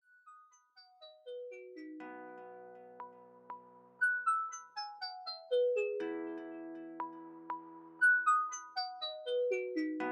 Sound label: Env Spring Scene; LG Wing 5G Verizon